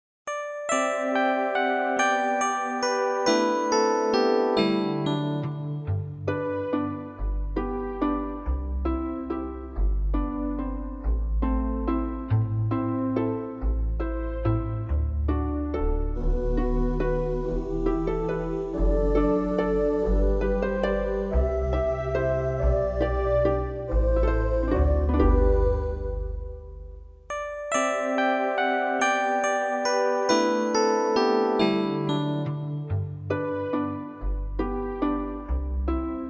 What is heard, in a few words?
Instrumental; Dia di porco